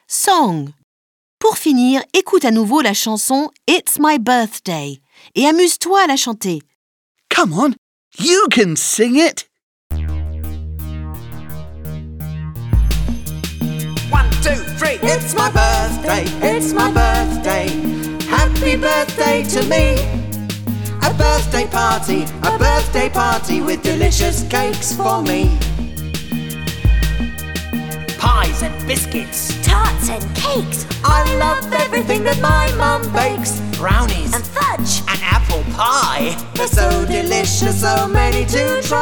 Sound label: song The happy birthday song; I Love English for Kids